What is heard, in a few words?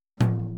Boogaloo, Intro, Tom Rack, Drums, 82 BPM